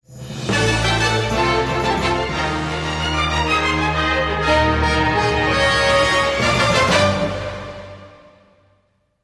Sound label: Closing